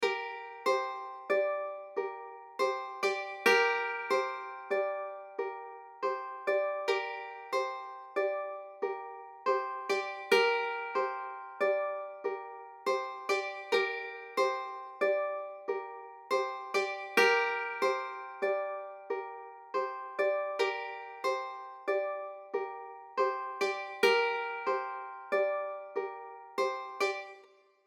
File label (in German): Gitarre Dry